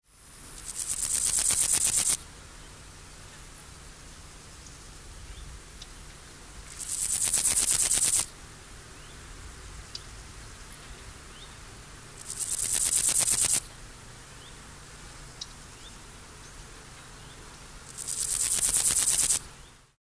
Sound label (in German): gemeiner grashuepfer; heuschrecken